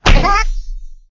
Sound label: Snowman Tap; Clickergame